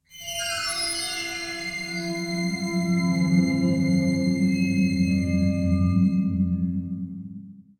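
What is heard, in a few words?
fire extinguisher pickup; broken sword the sleeping dragon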